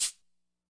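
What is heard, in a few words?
classic hip hop shaker; STYLE KITS (Hip Hop, Etc); Classic Hip Hop Mega Kit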